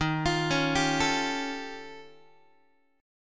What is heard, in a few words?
D sharp 9th Flat 5th D sharp, Guitar; arpeggiated; x,11,8,11,11,8; 4A,4E,5A,5D,5G#,6C#; JGuitar